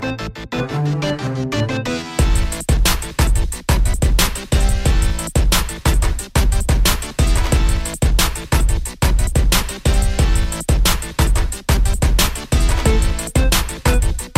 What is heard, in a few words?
no vocals